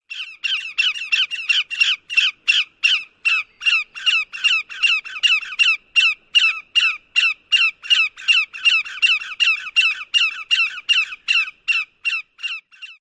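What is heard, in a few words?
Lesser Yellowlegs flight alarm calls of pair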